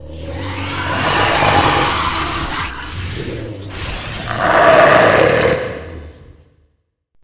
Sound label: Jewel Power